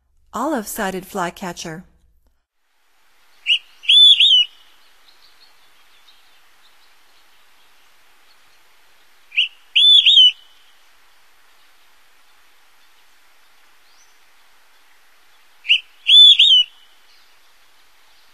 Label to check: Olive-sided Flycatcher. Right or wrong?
Right